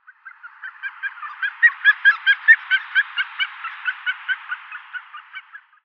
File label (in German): Kragenente Histrionicus histrionicus; Vogelstimmen